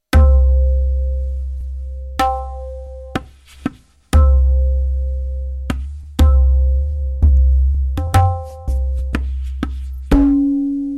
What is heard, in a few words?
jhaptal vilambit 30bpm